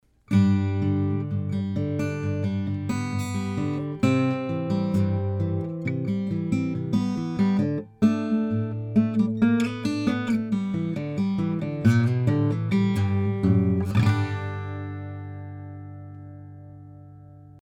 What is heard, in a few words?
Waterloo Jumbo King Deluxe